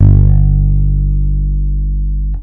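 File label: bass guitar G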